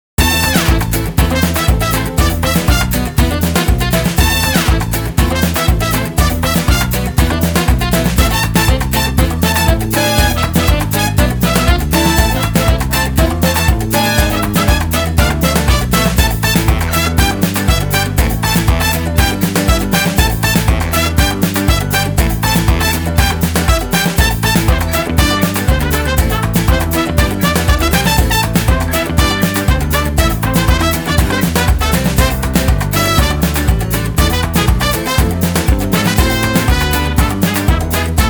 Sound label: folk music